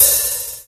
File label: reverb open high hat sample f sharp key